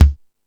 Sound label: Rock N Roll, Drum Machines